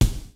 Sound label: kicks snare, kid; Kid Nepro; Elite; KICKS SNARES